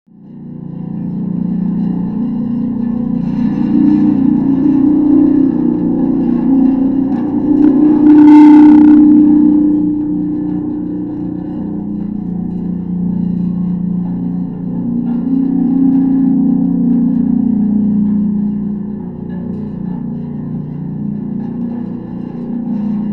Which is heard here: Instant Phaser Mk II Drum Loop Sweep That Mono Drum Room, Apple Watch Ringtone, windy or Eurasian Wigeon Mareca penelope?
windy